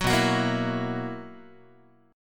B Major 7th Suspended 4th B down Guitar; strummed; 9,7,9,8,8,x

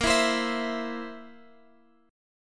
Bb Suspended 2nd Flat 5th Bb down Guitar Drop D

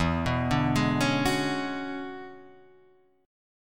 E 13th; arpeggiated; JGuitar

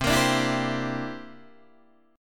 C 9th C down Guitar Open D

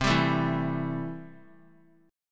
C 6th C down, chord; C6 strummed

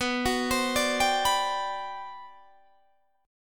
G Major 11th B

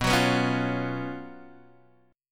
F sharp Major 7th Suspended 4th, B, chord

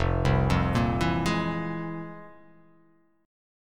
F-Minor Major 11th, down; FmM11 arpeggiated; JGuitar

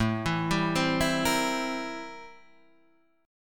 A-Minor 7th Flat 9th, chord; A-7b9 Chord {5 7 5 5 5 6} arpeggiated

